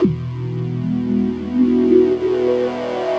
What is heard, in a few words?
PAD; GrooveMaker demo; Serious Software; Macworld 1999-04